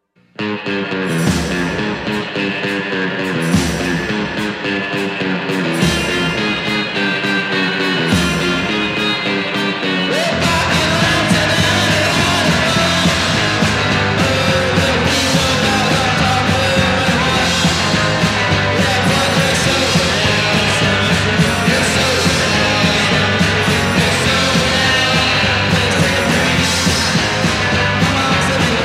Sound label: Haunted House